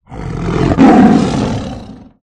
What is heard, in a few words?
tiger; animal sounds